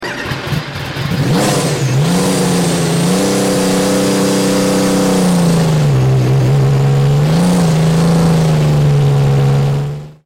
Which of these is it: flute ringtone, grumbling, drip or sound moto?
sound moto